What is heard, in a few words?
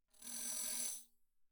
tambourine finger roll mf; Percussion